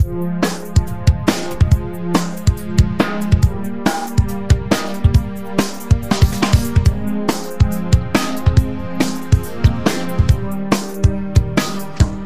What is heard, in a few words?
action music